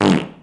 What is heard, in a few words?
FART; mahlendur